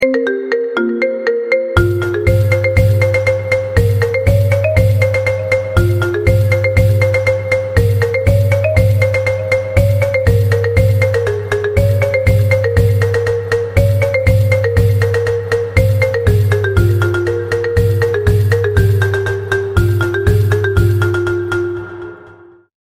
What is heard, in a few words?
iphone 13 marimba tiktok